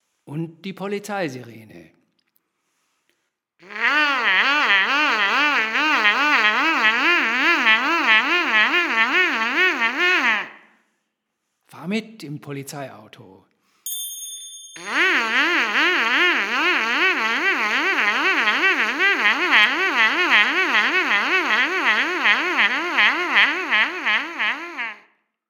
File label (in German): Polizeisirene mit Mundstueck